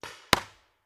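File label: Paintball Gun Impact; Sound Ideas; Guns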